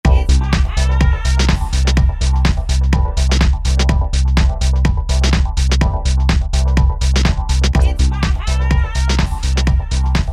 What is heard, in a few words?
Elastic House